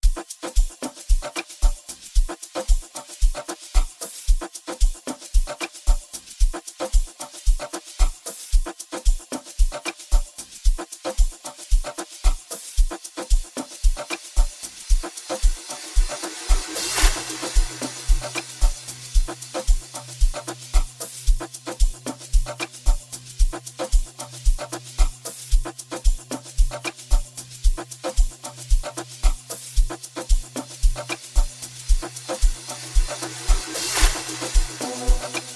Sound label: Noise